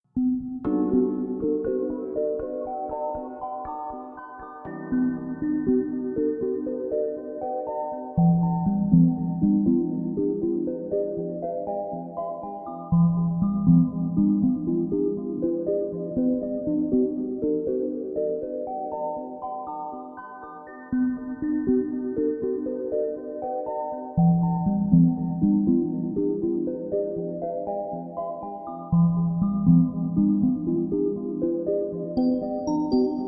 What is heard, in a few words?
chillout, 432 hz; lazy hours; Leniwe godziny